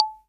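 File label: SR95 percussion; Best Service; Dance Mega Drums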